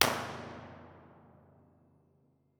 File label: tunnel entrance, innocent railway tunnel